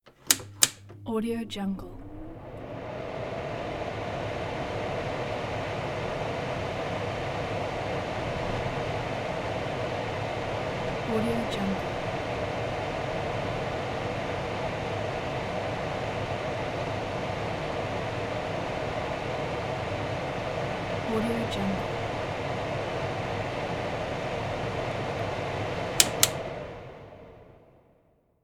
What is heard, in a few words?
kitchen exhaust fan; soundjay